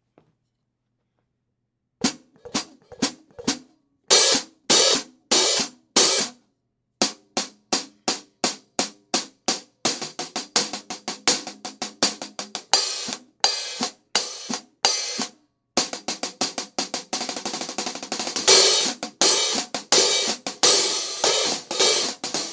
Epic 15" High Hats

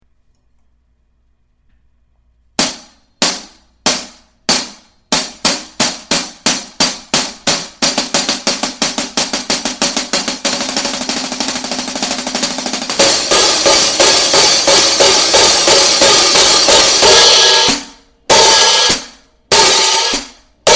15 Classic

